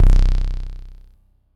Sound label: bass stab